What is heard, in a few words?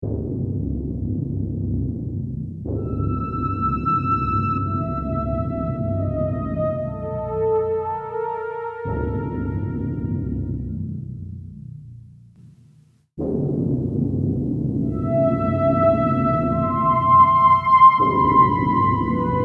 Roland SRV 2000